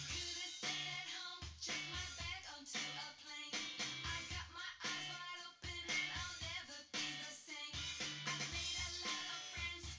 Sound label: wdrums synthconv